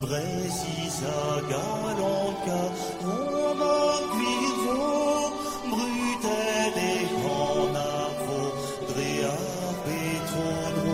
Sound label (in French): hymne breton ringtone